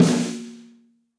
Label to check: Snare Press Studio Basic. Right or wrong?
Right